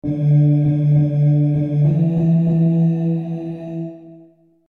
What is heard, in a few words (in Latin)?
veni sancte spiritus tenor; Veni Sanctus Spiritus; pingst